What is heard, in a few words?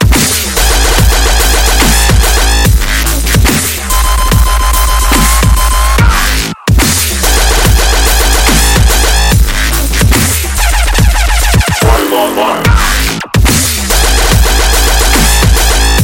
Dubstep Cyborg